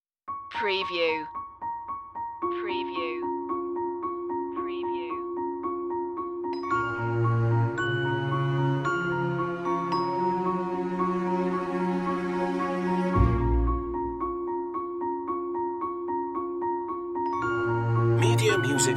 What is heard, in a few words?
no choir